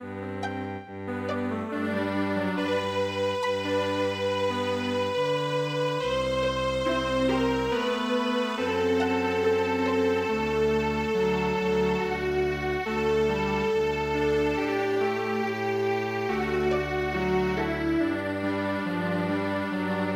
Chilean Strings Quartet; midi; traditional

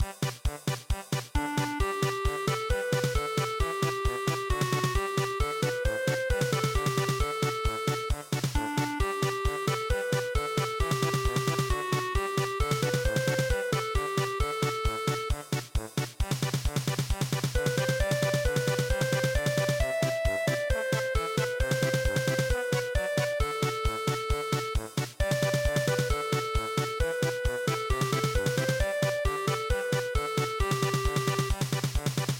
Polka; Sandamannchen

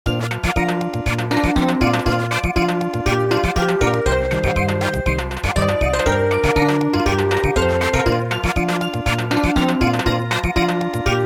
Samba

